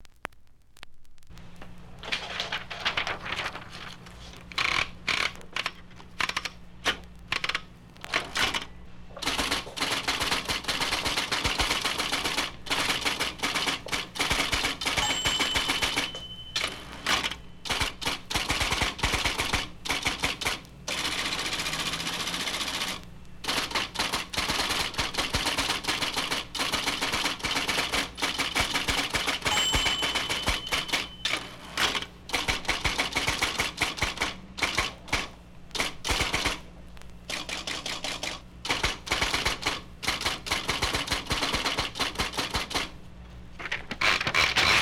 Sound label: IBM Electric Typewriter